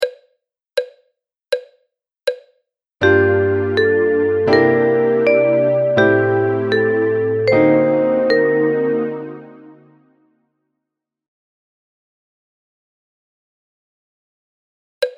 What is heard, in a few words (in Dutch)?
melodisch dictee